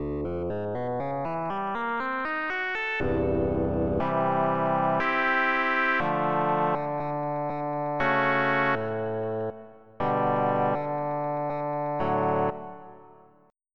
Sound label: Rhythm Percussion; FF7